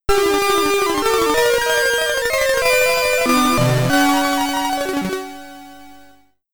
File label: Game Start; Gaplus; Commodore 64